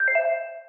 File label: coin